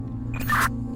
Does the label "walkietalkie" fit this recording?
Yes